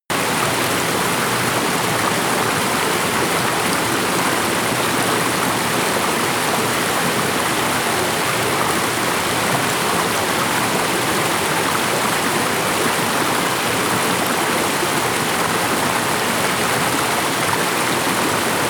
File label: creek large